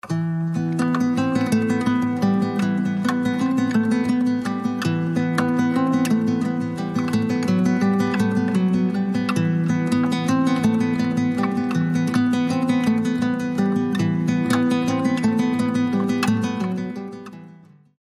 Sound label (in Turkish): santral fon muzikleri